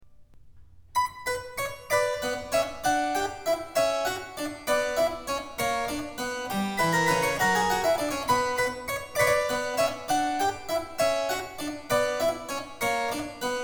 Rondeau c minor partita BWV 826

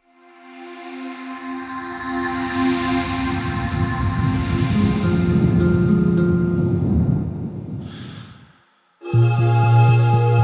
Experimental Song

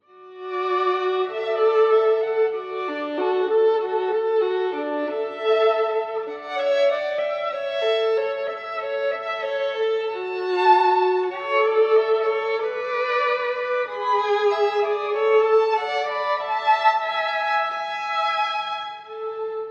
Violin duets; Mozart

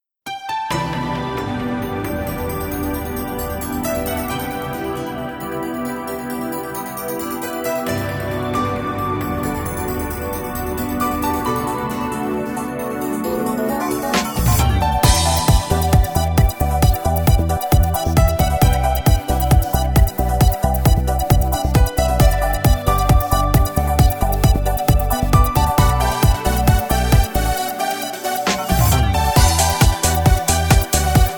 Rain Dance Remix

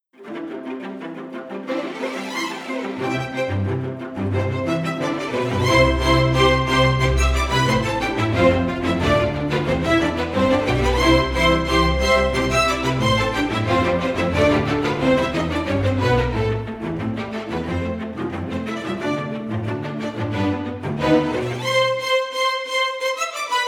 Lively Strings; Scriptnotes

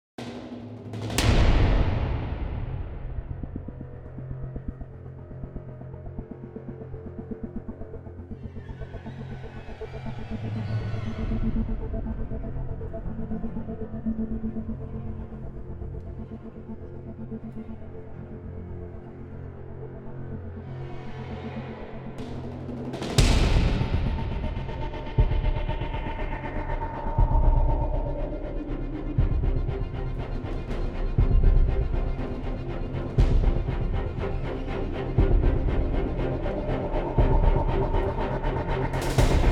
String Tension

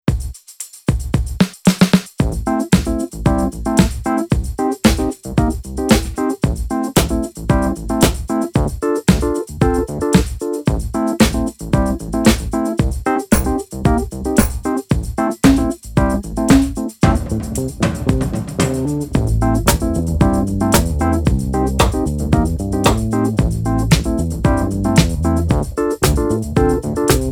Raw Muffled Snares

